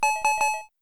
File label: message tone